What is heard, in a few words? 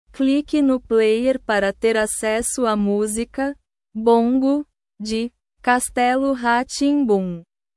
bongo